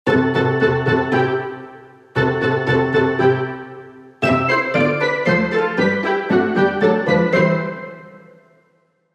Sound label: Jingle Pizzicato